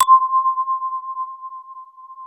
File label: MALLET PADS; Pro-Rec - Synthsonic